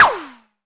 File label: Arturia MiniBrute sn